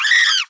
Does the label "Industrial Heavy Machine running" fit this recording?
No